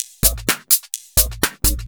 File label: Electro Loop 128BPM; Vengeance Samples